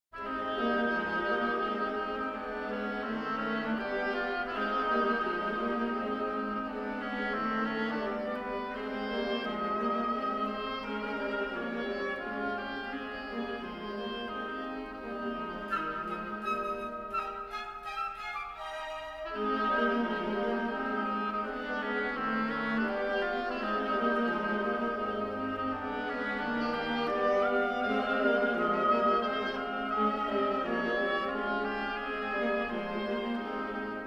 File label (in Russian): Симфоническая сказка Тема утки; Прокофьев; 'Петя и волк', соч 67